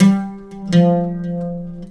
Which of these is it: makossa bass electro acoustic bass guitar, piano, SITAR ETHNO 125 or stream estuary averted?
SITAR ETHNO 125